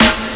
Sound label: clear snare drum a key